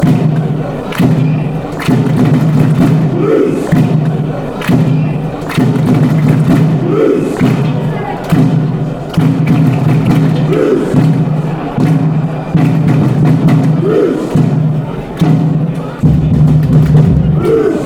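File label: blues, football songs and soccer chants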